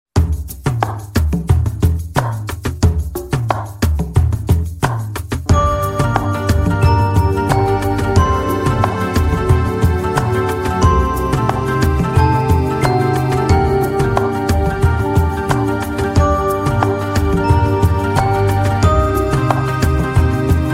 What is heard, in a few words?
islamic intro ringtone